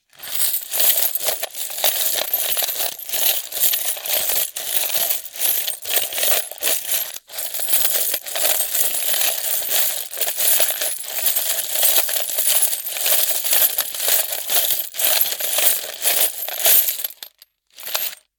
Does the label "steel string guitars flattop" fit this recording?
No